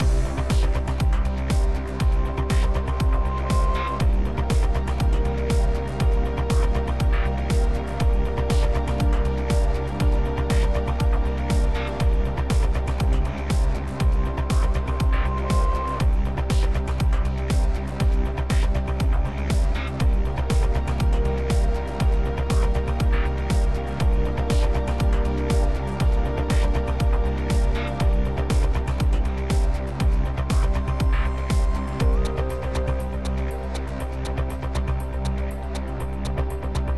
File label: Downtown Dash 8CH 120BPM; Mirror's Edge Catalyst